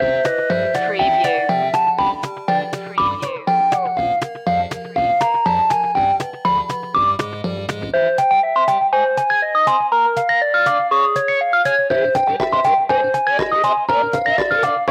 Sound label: 8-bit boss